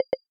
error; Google Nexus Player

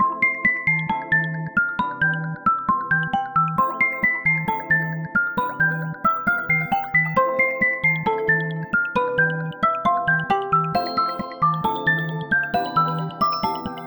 Raindrops, ringtones; Samsung Galaxy S25 Ultra

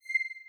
camera focus, ui

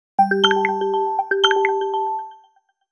Message, Nokia Alerts; Windows Phone apps

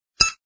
Pwr Attach

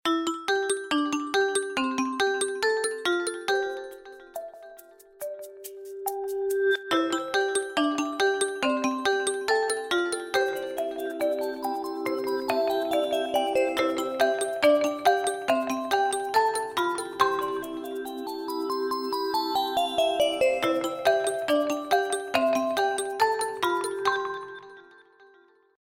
Bell, alarms; Infinix; XOS 10

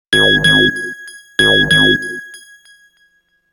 Message, Nokia Alert tones; Nokia 7380

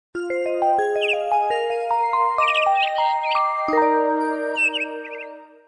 Power On; LG; KW838

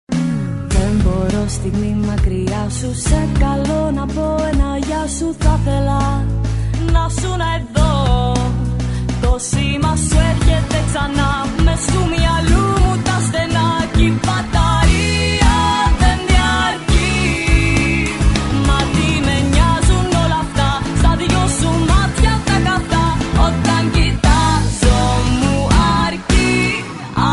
ring tone; Cosmote; COSMOTE MU

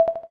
Xylophone; Samsung SGH-i310; Dialer Sounds

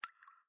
Unlock; Infinix XOS 15

